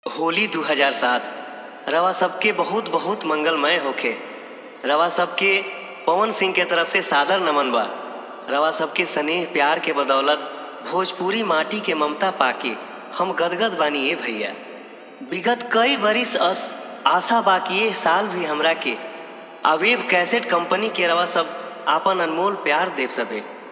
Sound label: Hard Bass Mix